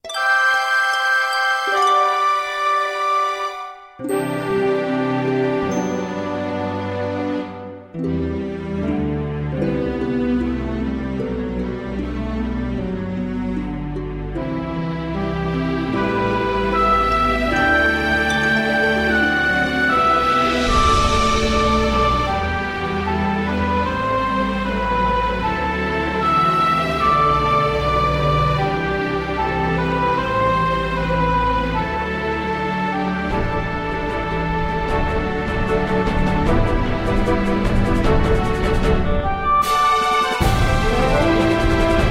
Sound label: BGM Opening; rpg spatiotemporal story taiyo project android